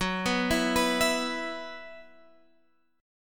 Gb 7th Suspended 4th Gb down Guitar Half Step Down, chord